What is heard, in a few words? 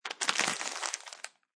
Plastic Wrapper Smush, Plastic wrapper smushed on counter; Crackling; Objects